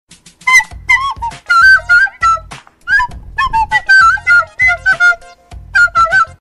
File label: default dance